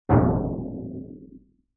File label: Timpani Hit Bright; Vintage Cartoon; Drums & Percussion